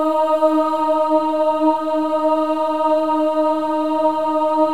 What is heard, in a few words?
OH D#4; Spectrasonics